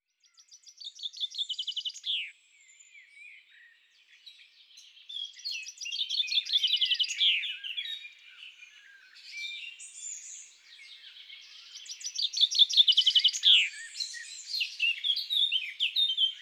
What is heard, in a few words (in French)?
Pinson des arbres, Chants d oiseaux des bois et des forets